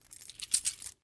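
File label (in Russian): негромкое брякание застежки от бижутерии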